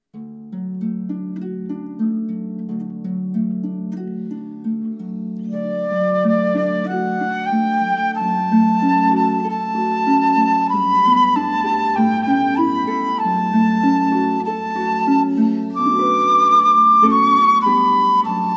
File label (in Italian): arpa flauto